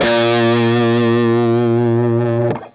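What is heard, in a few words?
fuzz guitar vibrato; FUZZGTR1 VIB; Sonic Reality; Vintage Timetraveler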